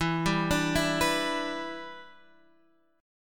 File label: E Minor 7th, Guitar, Standard; x,7,5,7,5,7; arpeggiated; JGuitar